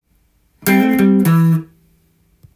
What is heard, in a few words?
blues, guitar; blues short phrases